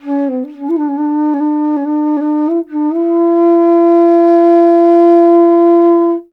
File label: INDIA BASS, IND BASS; Spectrasonics; Heart of Asia; IND BASS PH